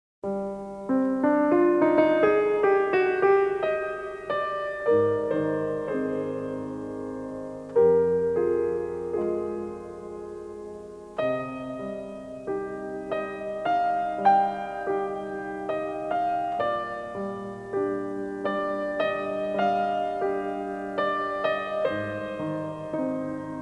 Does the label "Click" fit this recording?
No